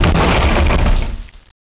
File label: DEBRIS